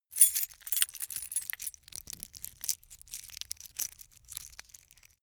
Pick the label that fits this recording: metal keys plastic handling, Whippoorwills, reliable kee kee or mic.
metal keys plastic handling